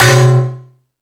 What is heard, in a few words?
Thundadome, E-MU Pro-Cussion; drum machine